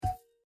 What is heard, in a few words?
Reel Stop; Holy Goat